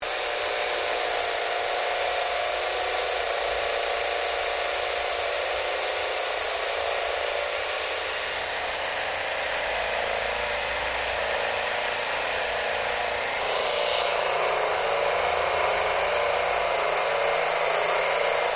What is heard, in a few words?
EPILATOR OPERATING LOOP; Various machines; Machinery Sound Effects; Machinery (Sound Effects:Machines)